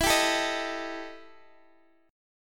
E Minor Major 7th Double Flat 5th, E, down, Guitar, Open D